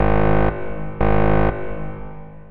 Alarm; Barotrauma; TSFX

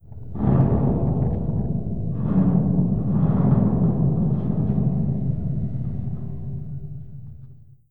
creak; Barotrauma; Subsurface

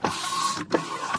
pump; Barotrauma; Neurotrauma

